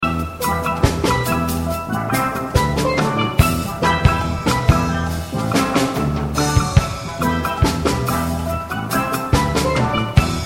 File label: Ringtone